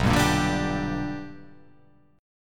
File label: B Minor Major 7th Sharp 5th, chord